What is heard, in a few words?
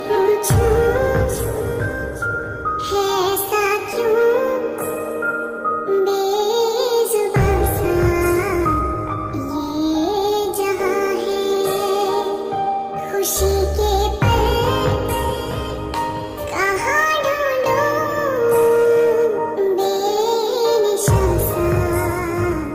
female version cartoon song ringtone